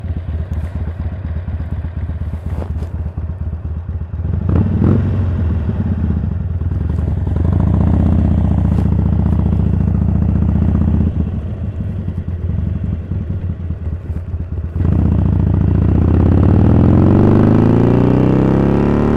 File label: Cobra Sidewinder Slip On Sportster 883 Iron; échappements; motos